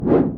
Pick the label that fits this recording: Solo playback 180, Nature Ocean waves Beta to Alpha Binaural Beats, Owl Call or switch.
switch